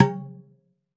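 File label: Spring Drum Hit; Roland BK-5; Percussion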